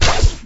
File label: Bow Fire